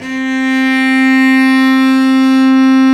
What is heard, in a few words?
STR VC Arco Solo p